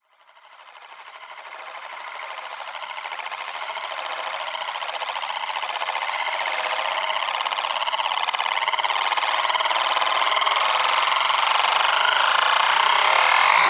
Riser Plastic; Riddim; One-Shots; SFX; Ghosthack; Riddim Essentials Volume 2

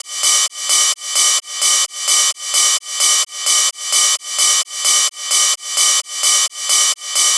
Ride Loop 130, Techno; Techno Sounds 2024; Rides

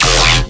Bass Hit E, Dubstep; Gleam Bass; Ghosthack; AC2024 - Day 13 - Dubstep Sounds 2025

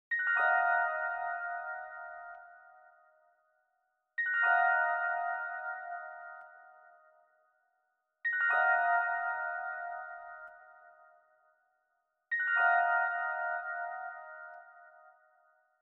118 BPM D# Min Keys; Loop Stems; Cymatics; 2022 Melody Collection Platinum Expansion; Miami

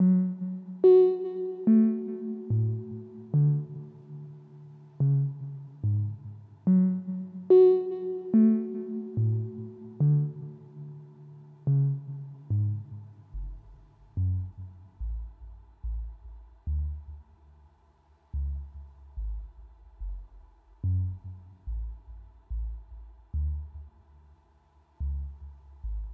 Dark Loop, 144 BPM F# Min Synth Key; Stems; Paranoia

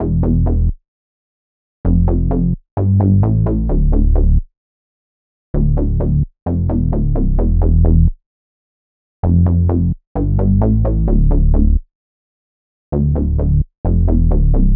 130 BPM A Min OB-6 Bass; Melodies; Loop Stems; Cymatics; Osiris

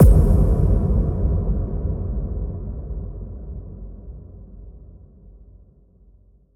Impact; Ghosthack - Summer Freebie - Acapella Kit; Construction Kit 122bpm A#min

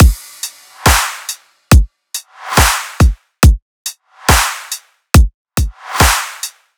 Riddim Drum Loop 140 Full

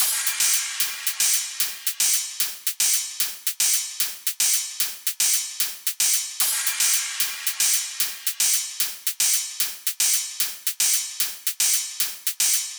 Dubstep Hihat Loop - 150 BPM; Drums; Loops - Top

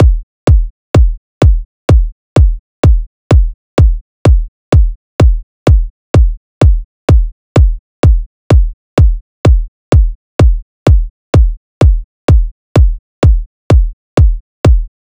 Drum Loop, 127 BPM Kick, House; Loop Stems; Cymatics; GHOST (Beta Pack); All There Drum Loop

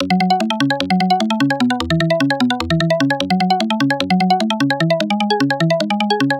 Arp F# 150, Melodic Loops; Barrel; Ghosthack - Color Bass Essentials

